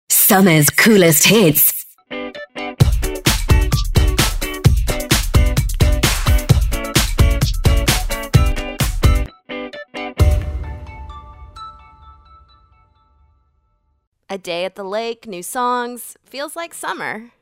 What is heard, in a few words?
Summer Jingles